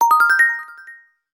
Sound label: Chime, notifications; Rollable LM R910N; LG